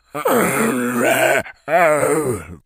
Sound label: amb; cstrike